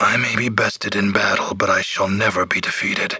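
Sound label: dying; nwnds audio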